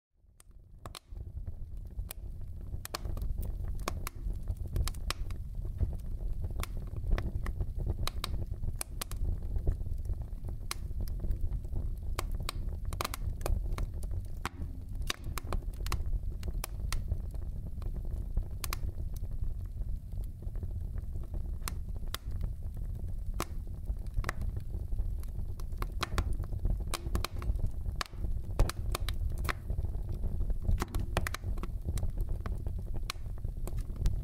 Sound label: Cracking Wood Fire In Old Oven